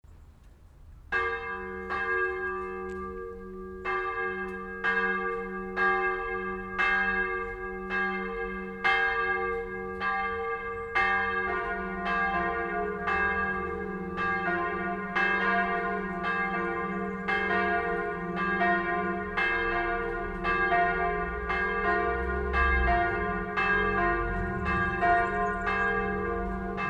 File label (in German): glocken